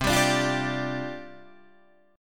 C Suspended 2nd Suspended 4th, down, Guitar, DADGAD